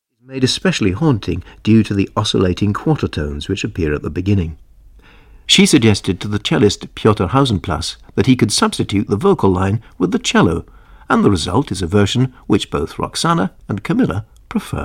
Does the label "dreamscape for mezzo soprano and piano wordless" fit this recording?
Yes